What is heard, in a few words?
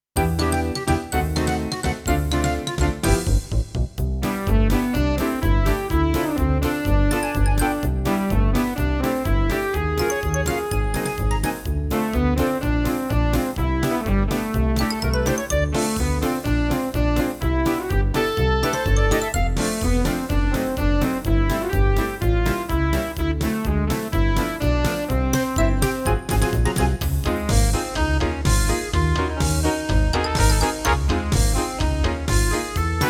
CM500 GS